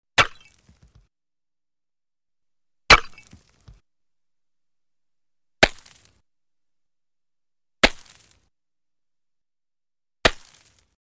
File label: Chop Ice On Lake Shore, Axe Or Pick Into Solid Material, Some Ceramic Tone 4x